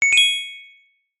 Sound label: multimedia game sound gem treasure found